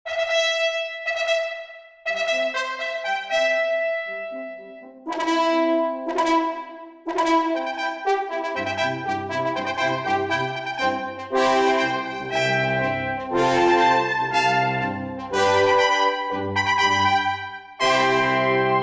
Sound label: Elegant Trumpet, alarms; Rollable LM R910N; LG